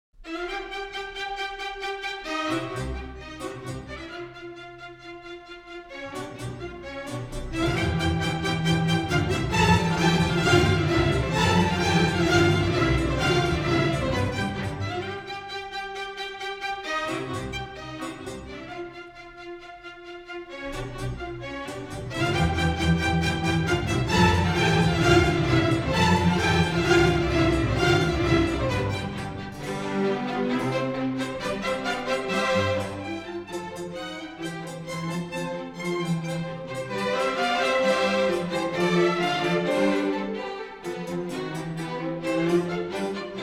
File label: Dance C Male and Female Ballet Music; Ballet Music from Don Juan